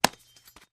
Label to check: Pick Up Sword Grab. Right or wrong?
Right